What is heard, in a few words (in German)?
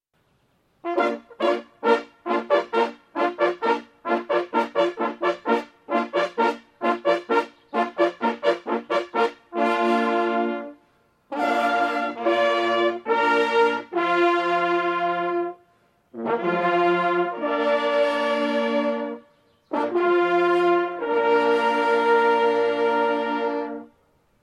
Jagdhornbläser Fuchs tot; Holthausen